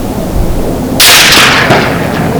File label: 30 CAL TRACER; sound of bullets